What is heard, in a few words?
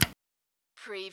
Servo Panel Sound - Switch TV